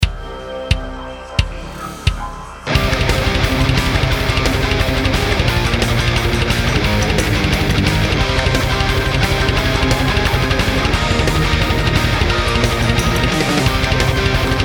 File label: Power Metal